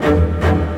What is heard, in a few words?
stringers